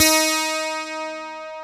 12 STELAG E3, EL-AC12

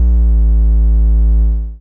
Sub, drum

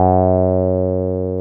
303 HLLW F#2, ROLAND TB303; Big Fish Audio; First Call Vintage Keyboards

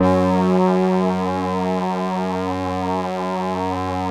LAMBDA, String Machines

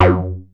OB F#3 -L, Rare Synthesizer; Hollywood Edge - Giorgio Moroder Rare Synthesizer Collection; OB 4 VOX.

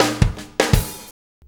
Shuffle Fill; Shuffles; Fills; Drum Tools

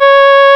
REED C#; Big Fish Audio; Synclav FM

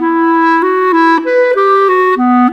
Clarinet; Sonic Foundry (Sony Creative Software); Orchestral Series VOL-4 - Rock and Pop

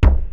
Ac BD, Alesis DM-PRO; 300 Drum Machines

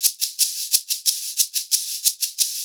shak, percussion loops

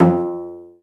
Cell Pz F2; Digital Sound Factory Virtuoso

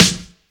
Jomox Xbase888

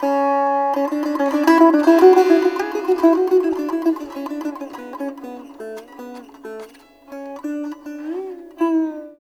SITAR; Spectrasonics; Heart of Asia

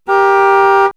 Horn; X-Static Goldmine

